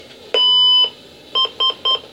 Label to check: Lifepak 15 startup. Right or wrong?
Right